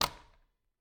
Cabin, front door, deadbolt, lock, latch, thick, open, stuck, wide; Eiravaein Works; Vaeyan IV